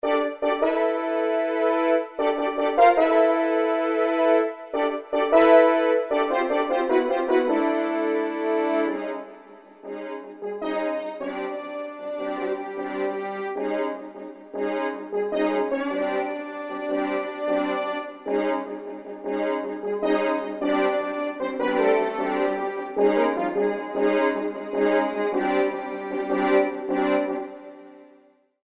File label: horn